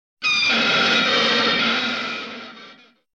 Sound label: metal, gnissel